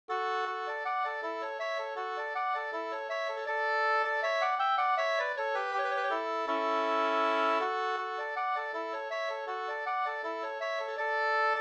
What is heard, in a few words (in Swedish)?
skanklat